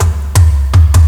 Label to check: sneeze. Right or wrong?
Wrong